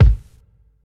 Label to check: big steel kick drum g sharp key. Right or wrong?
Right